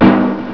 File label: TIMPANI; Kids Can Save The Earth; Global Warming